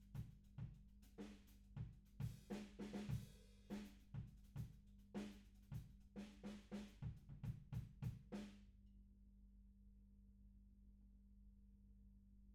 HAMMOND BOT

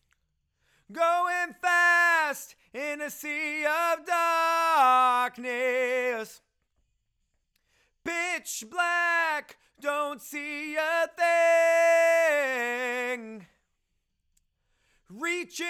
Chorus

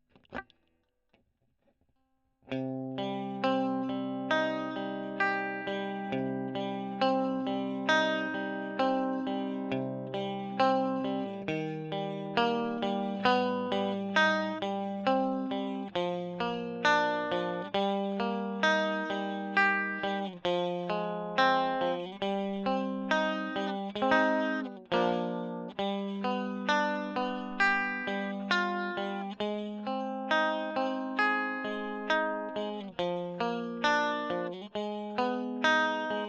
E.GTR Gefell

